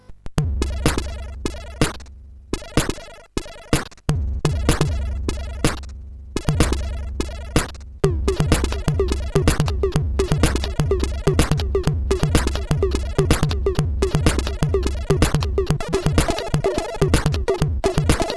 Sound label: House Sound, sid CSG8580R5